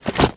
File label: inv close, interface